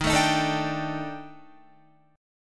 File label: Eb Major 7th Flat 5th Eb down Guitar; EbM7b5; strummed; JGuitar